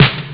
short, drums snare; OctaMED SoundStudio V1

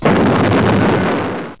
Mother Ship Explode; Soldiers 2

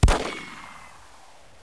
PISTOL

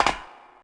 Metal Land Lite, Footsteps; CD Action 45 1999; Urban

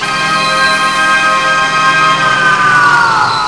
Tingle Sound

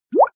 attach sound; bubble t

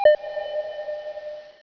Sub Ping; Rolly

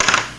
Chip on Rack; iPoker 2000

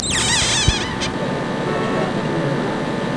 squ buzz

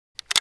kar sniper reload fill, weapons foley; Spearhead Demo; MacFormat 2003 June

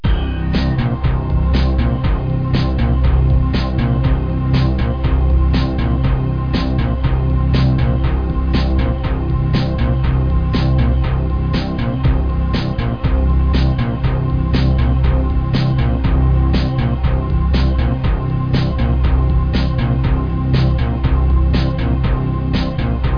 DANCE LOOP; Blender Volume 2

